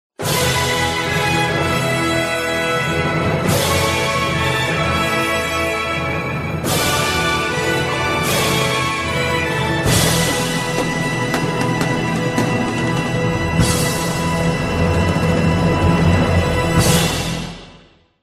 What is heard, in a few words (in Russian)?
Фанфара; 19 let ARGO